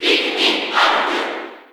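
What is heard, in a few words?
Cheer Japanese; 1.7 s; Alph; SSB4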